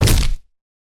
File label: impact